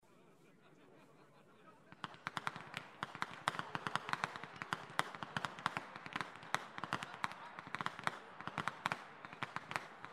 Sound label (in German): schnalzen, flachgau; ANTHERING I